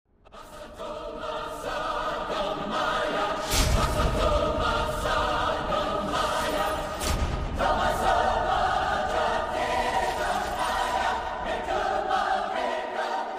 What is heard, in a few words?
asato ma sad gamaia mantra